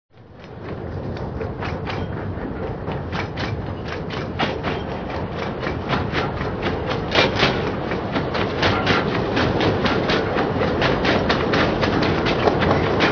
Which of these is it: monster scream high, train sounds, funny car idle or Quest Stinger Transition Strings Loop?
train sounds